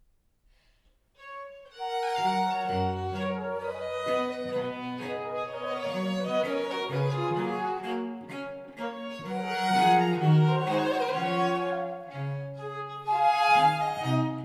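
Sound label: Arrangement for two flutes, two violins, viola, and cello; ninette a la cour; MIDI version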